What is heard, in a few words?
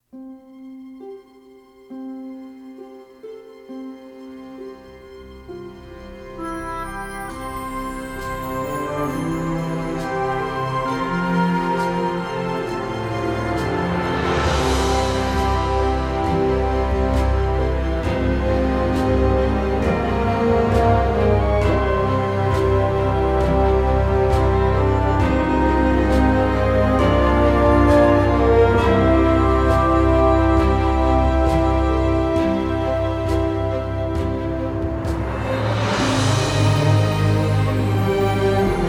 Theme Orchestral